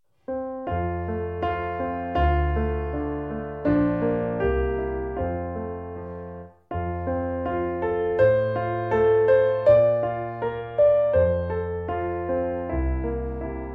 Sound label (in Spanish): Danza de las flores piano; musica I ESO 2015